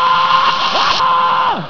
cri homme

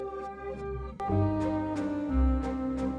HAUTBOIS

